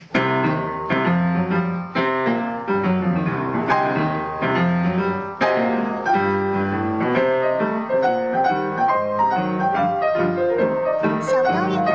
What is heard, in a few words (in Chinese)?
活跃 jazz